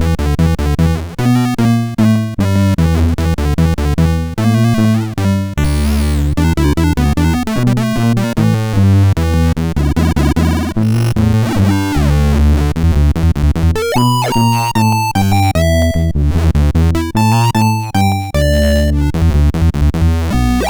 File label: Blues; Spike Super Blues Experience; MOS6581R4